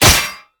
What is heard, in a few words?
metal, smallclaw combat abilities